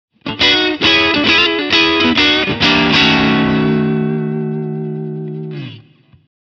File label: Clean Amp Sound